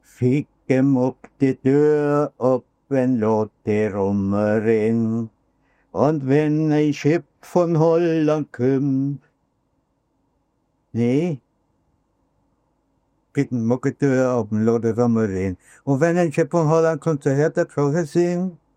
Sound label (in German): gesang; de rummelpott